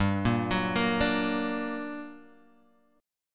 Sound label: G Minor Major 7th, down, Guitar, Standard; GmM7 arpeggiated; JGuitar